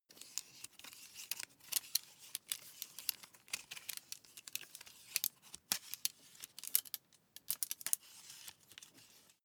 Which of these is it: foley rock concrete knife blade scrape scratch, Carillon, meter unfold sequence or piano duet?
meter unfold sequence